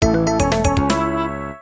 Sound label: RACE START; EDGE RALLY